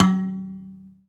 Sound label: Bass Kalimba G2; Multisamples; Digital Sound Factory Planet Earth